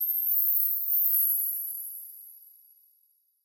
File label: fairy dust; soundscrate